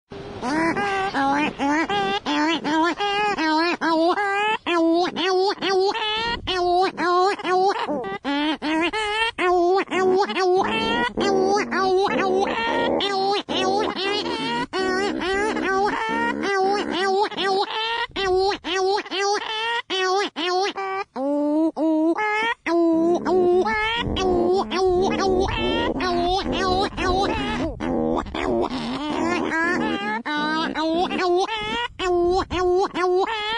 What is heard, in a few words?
Cory’s shearwater